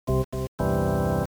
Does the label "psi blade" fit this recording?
No